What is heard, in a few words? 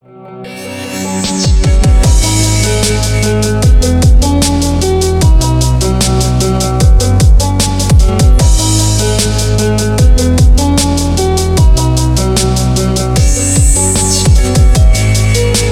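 piano beat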